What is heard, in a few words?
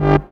Synth Shot G; Mutekki Media; Vengeance Electroshock Vol.2